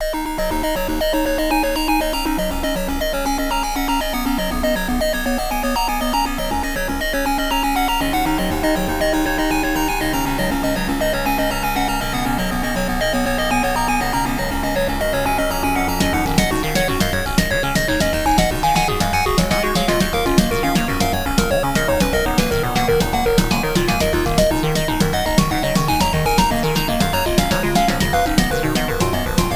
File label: racing tecno